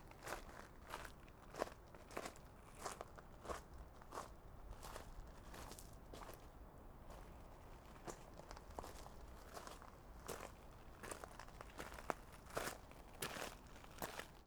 Passos no cascalho captacao mais distante; Colecao Sonora do Cerrado

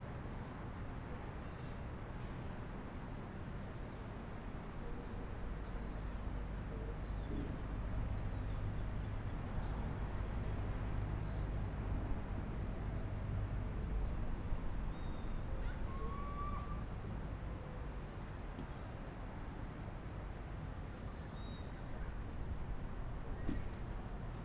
Ambiencia estacionamento unb com transito longe, passaros e aviao; universidade de brasilia

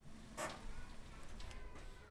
Carro Puxando Freio de mao e desligando em garagem fechada de uma casa; maquinas

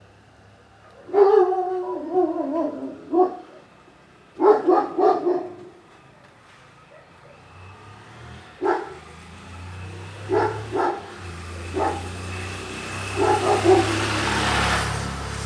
Cachorro grande porte late ambiente noite bairro residencial; animais; Coleção Sonora do Cerrado

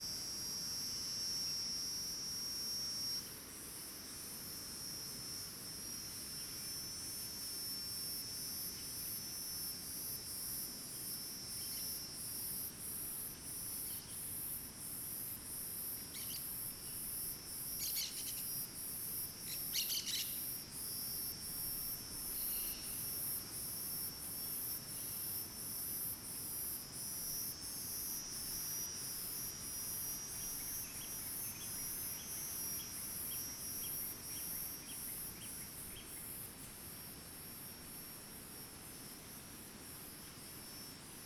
Cigarra diferente bem estridente outras cigarras no fundo na mata seca; insetos

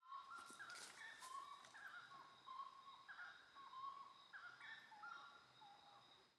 Saracura Tres Potes Cantando ao Longe; aves

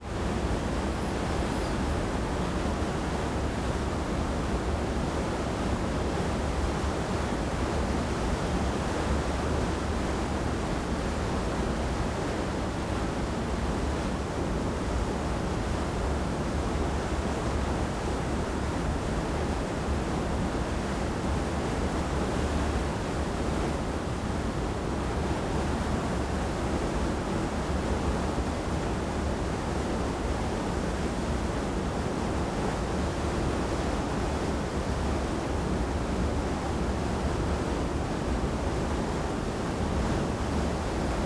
Cachoeira vale da lua som bem proximo com alguns passaros

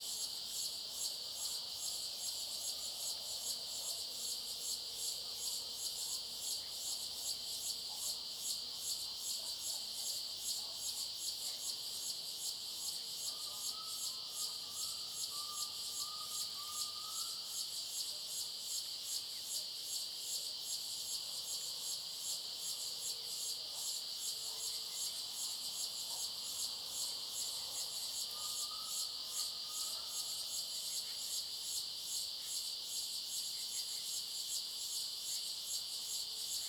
Cigarras na clareira som agudo fino passaro e cachorro longe; Cerrado; insetos; colecao sonora do cerrado

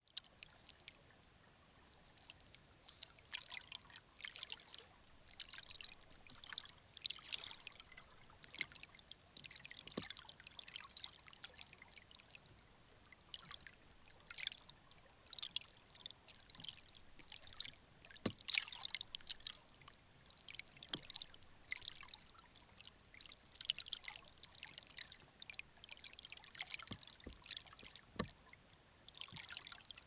Hidrofone Balancando Dentro De agua Parada Textura de Agua; lago

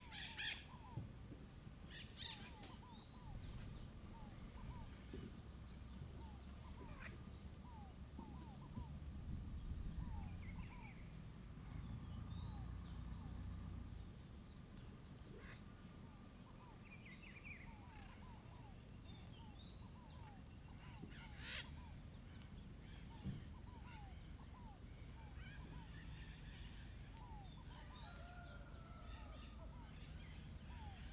Ambiencia rural de manha com passaros, porta abrindo e carro longe

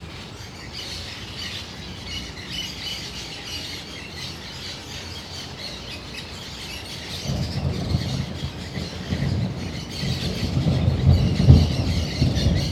Dois trovoes na varanda com muitos passaros

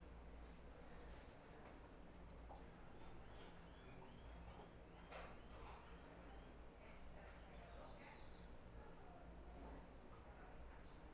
Ambiencia quarto abrace passos no inicio, mulher e homem cantando para cri; criança longe; cantarolar

